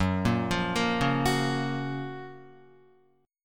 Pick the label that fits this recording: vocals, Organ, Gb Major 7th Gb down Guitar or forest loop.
Gb Major 7th Gb down Guitar